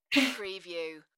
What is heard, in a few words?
Quick exhale; beanstalk audio